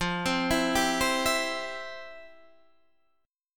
F Major 7th Suspended 2nd, F, down, Guitar, Standard; FM7sus2 arpeggiated; JGuitar